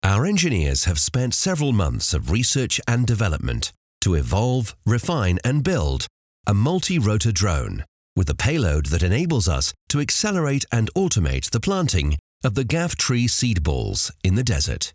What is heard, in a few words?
bassy corporate